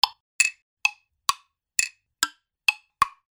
woodblock mix

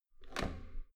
metal door heavy handle up

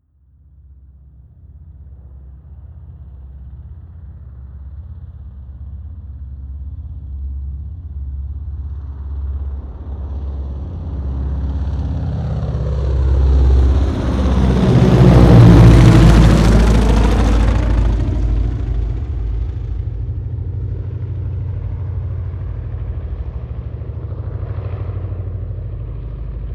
air strike

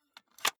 cassette case close soft

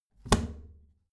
plastic trash can close heavy muted

